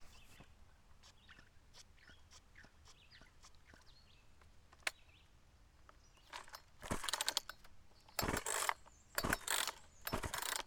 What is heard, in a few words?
Chainsaw